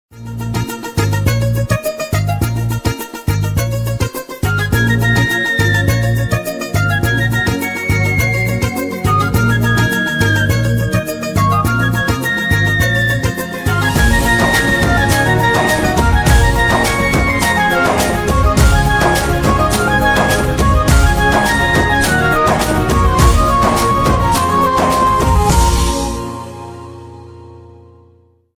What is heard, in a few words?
Love BGM - Flue Instrumental Ringtone; Bommarillu; south indian instrumental ringtone